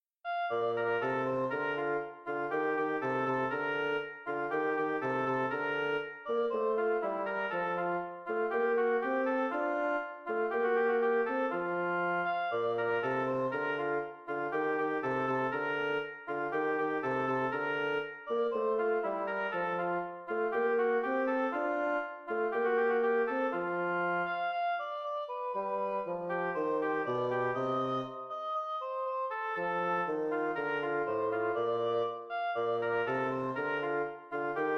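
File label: allegro duet oboe bassoon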